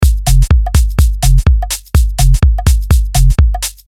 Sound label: deep h loop